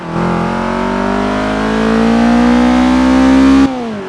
second, vehicles; gmod; tdmcars; frs

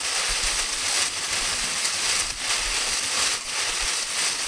grind weed loop; gmod

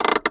lever; gmod; pcasino

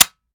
fire first; gmod; viper

